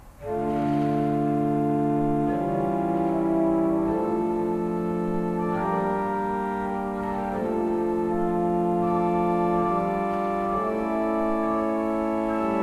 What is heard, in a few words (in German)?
Fleiter 19 II P, orgel